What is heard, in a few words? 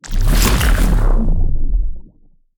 Impact